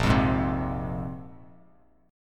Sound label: A sharp 7th Suspended 2nd; strummed; JGuitar